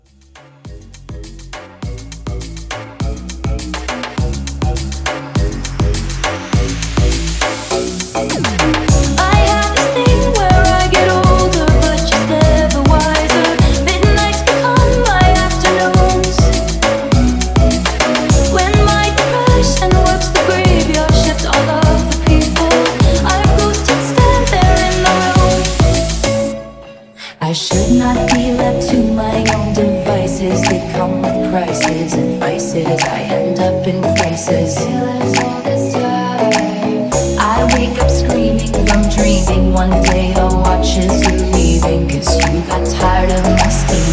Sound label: Moomba Mashup